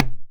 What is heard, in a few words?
Madal; Polyend; Simulacrum Sounds; 1 Shots; Acoustic and Electric; Drums